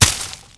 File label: bush; Mafie 1